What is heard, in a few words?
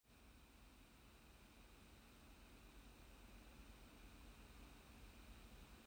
cooling fan